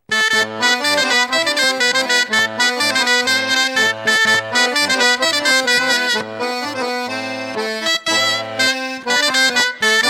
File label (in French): Treger Bal